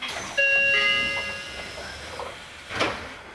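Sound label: Door Open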